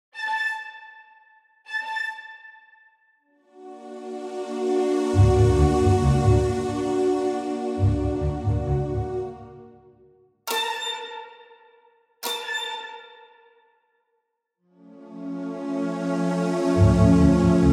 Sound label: Strings PLUS; Special Edition Vol 2